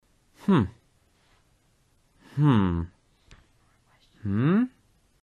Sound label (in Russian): hm mujsk